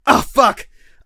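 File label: weapon reload; Escape from Tarkov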